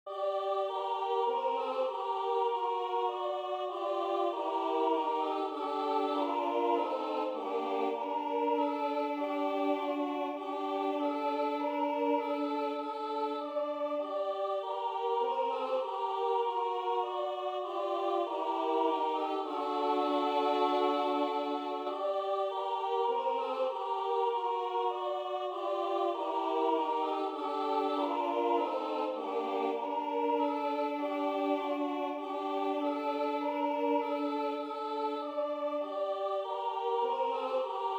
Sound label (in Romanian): Asta seara i seara mare, Dobrogea, colinde